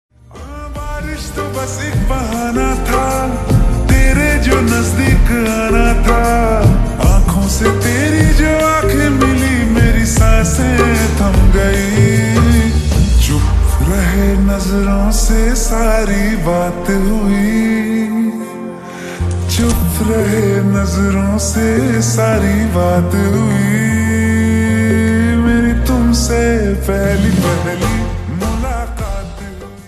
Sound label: Slowed Reverb Ringtone